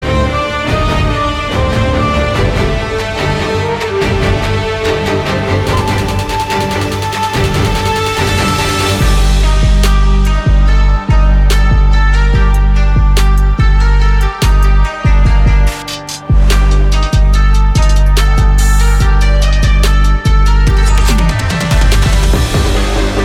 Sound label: Strong Bassline